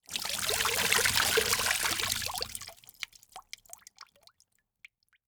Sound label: Liquid Water Water Pouring Into Plastic Tub With Water